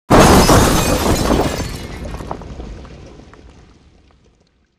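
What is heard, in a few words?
explosion close debris; corporate; Titanfall 2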